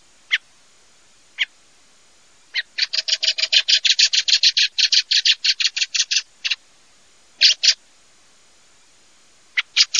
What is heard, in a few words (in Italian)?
SASSELLO SCHIAMAZZO